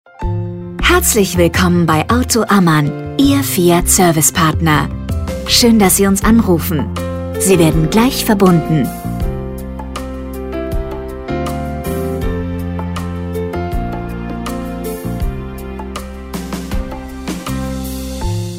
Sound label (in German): Warteschleife; Telefonansage Autohaus – KFZ – Werkstatt – Gebrauchtwagen Händler